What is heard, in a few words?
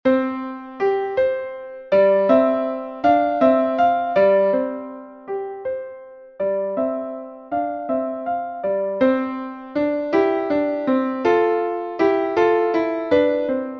pastorale hirtenmusik; zipoli domenico